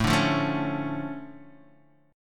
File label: D Minor Major 7th, A, guitar